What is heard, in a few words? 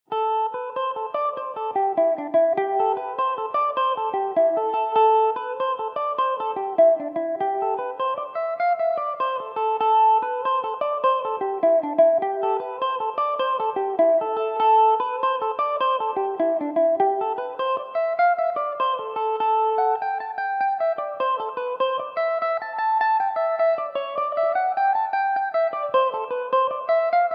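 A minor revelation, Irish mandolin; brian o lynn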